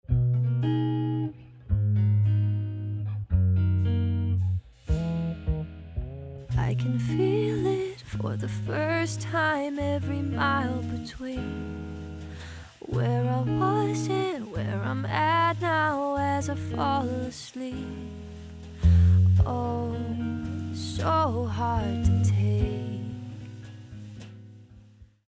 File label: Female pultec tube